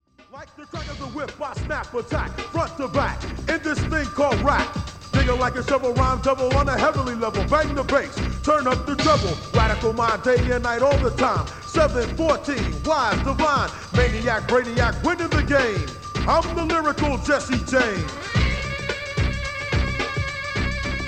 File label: Rank Arena C22 test recording, cassette deck